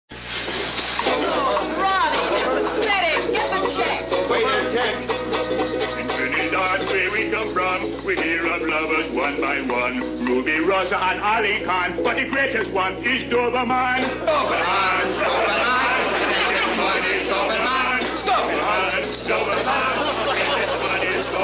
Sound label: Song